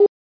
Alarm Missile; XWing

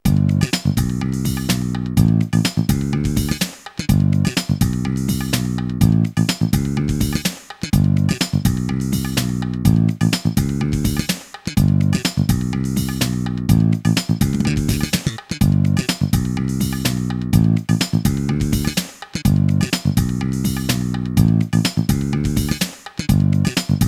funk

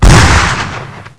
explode

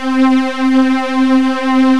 Strings; Eartraining 2.3

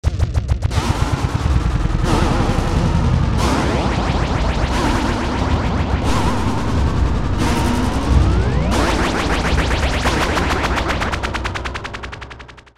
Fx Sci-fi

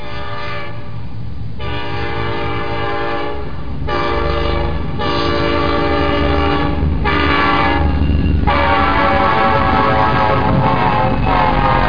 Train

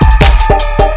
Empty Recycle Bin

